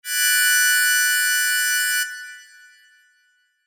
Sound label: Sho